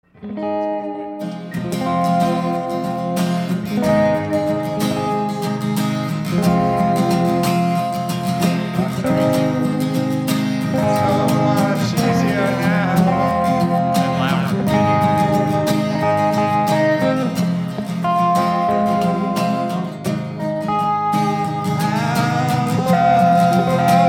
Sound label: acoustic, reprise